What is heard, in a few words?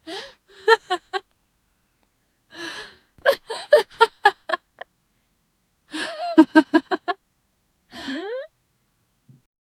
soft chuckle by female an; voices